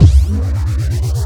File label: charge short; CrossCode; battle